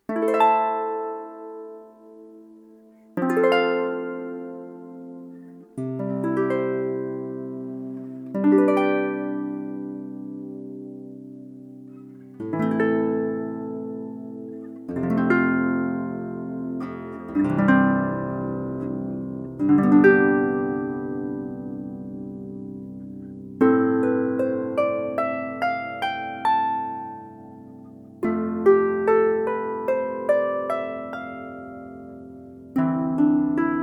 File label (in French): cordes nylon